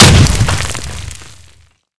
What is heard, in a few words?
explode